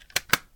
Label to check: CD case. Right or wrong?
Right